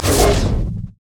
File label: sonic wave; LoL; leesin; blindmonk